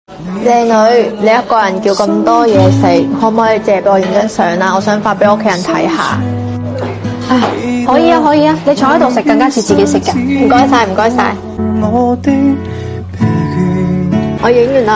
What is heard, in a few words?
laugh; breadradio